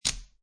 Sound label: Poker Chips Drop Two Chips Onto Another Two Chips; Gambling